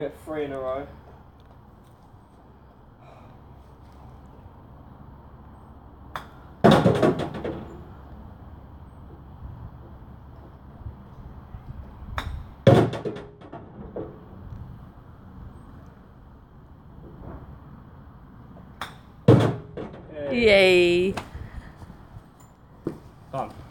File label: chipping in tumble dryer